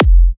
Kicks F; markus hakala

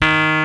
RHODES D#4; E-MU Formula 4000 Series Vol. 3 – Analog Odyssey; VK Instruments

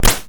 Electron Sidstation, Drum Machines

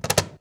CAJ FILL, cajon fill; Univers Sons - Raricussions

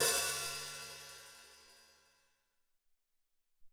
Med Hat, Alesis SR-18

